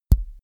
microphone pop on; objekte; elektronik

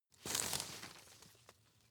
rustle soft; berufe

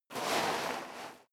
step sneaker gravel light slide long; schritte

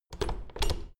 door plastic handle use creak

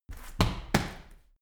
medicine ball wall throw, fitnessstudio

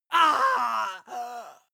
male groan deep pain; menschen; schreie

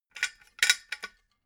metal scaffold pole splint; industrie-wirtschaft

